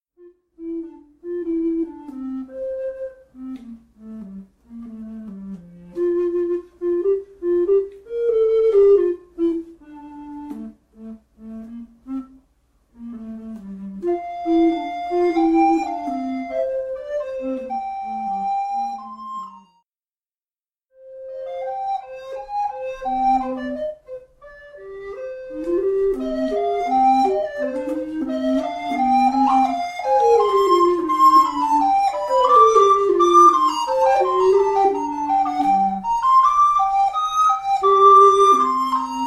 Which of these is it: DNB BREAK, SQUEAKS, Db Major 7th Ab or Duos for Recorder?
Duos for Recorder